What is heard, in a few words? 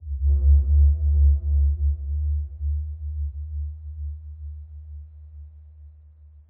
tenement low pulse; ambient; lfe; Half Life Alyx